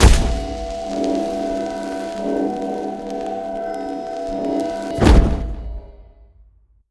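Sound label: mag alteration cast, magic; skyrim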